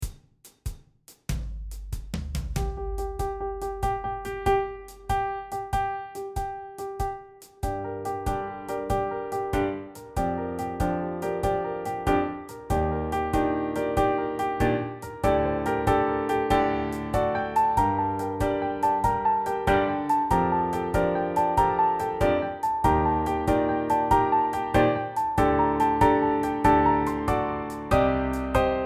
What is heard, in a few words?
Zig Zag backing, jig rhythm; improvisation for multi-level piano ensemble